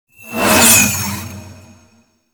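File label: Projectile Whiz By; Warframe; Cephalon Weave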